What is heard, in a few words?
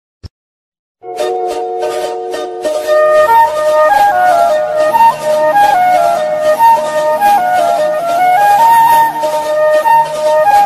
bike bgm pulsar bgm mass bgm; polladhavan; dhanush